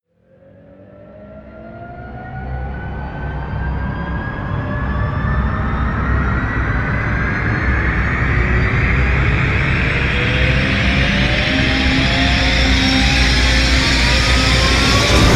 rise long aerial lift

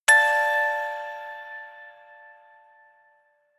music box hit

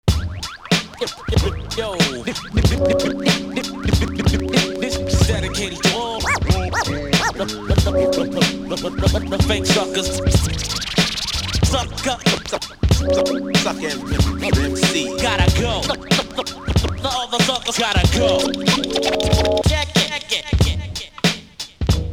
instrumental

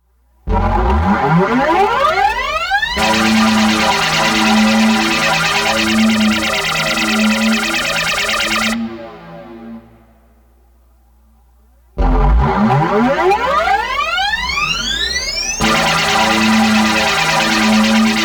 sonic tank long blast sound fx; alan howarth

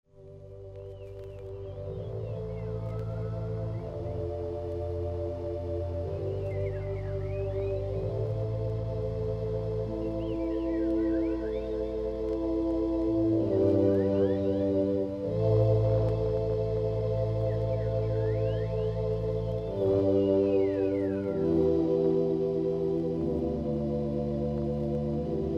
organ

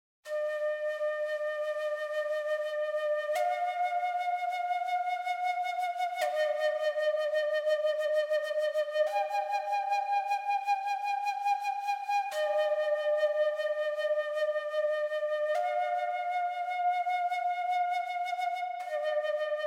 native american flute

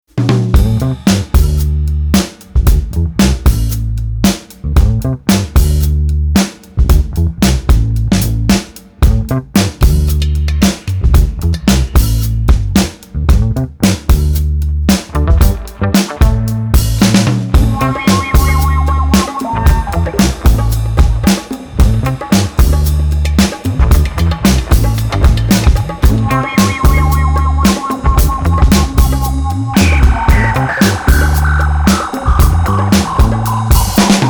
funk

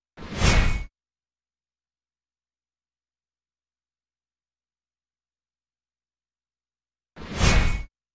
metal woosh

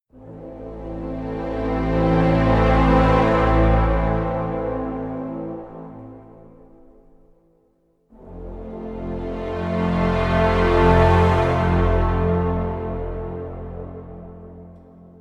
no choir no booms

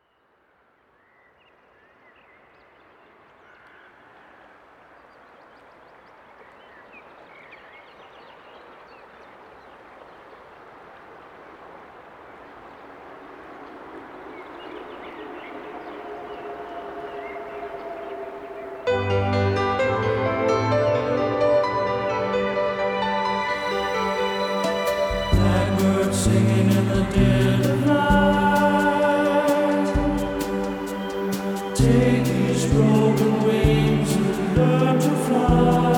gregorian chants

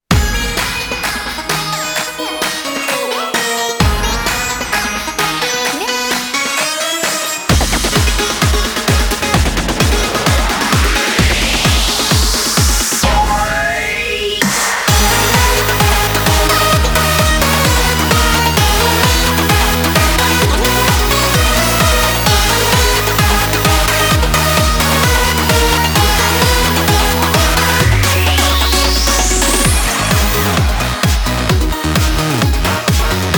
off vocal

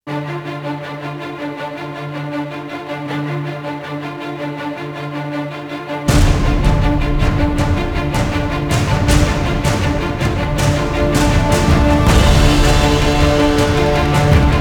no choir

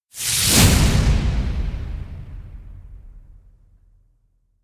whacks with whoosh

